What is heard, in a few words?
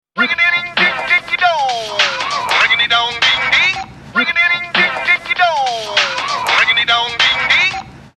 ring a ding dong; geico